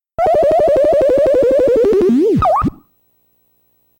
Player Down, music; SMM2; Link

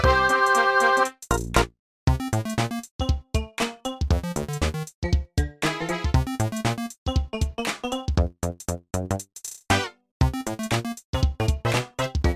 Staff Roll Underground; NSMB

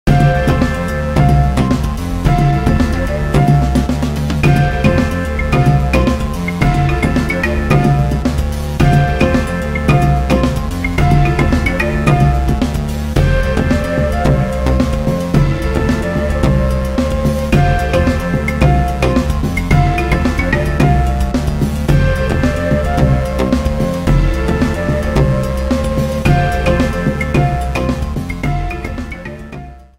Forest; SMM2; SMW

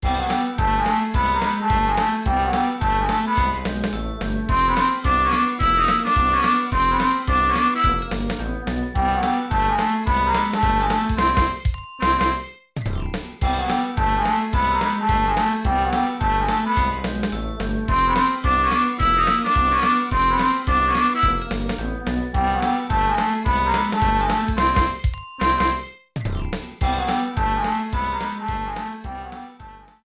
Level Up theme sample; Paper Mario

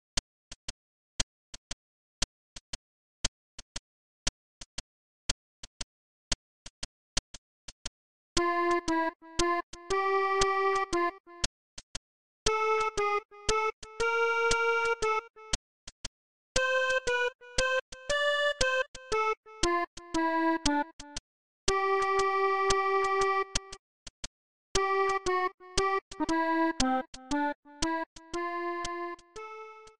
Rainbow Paintbrush; Mario Paint

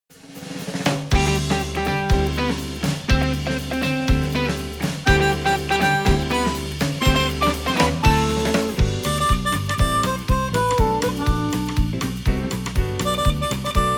Beach Theme Jazz Arrangement; SM3DL

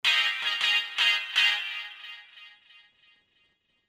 Shopping Network Jingle; LM3

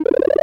Item Pop; SMW

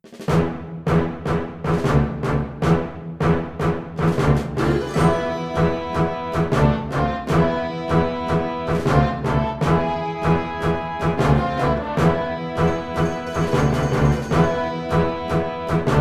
Castle Theme; SM3DL

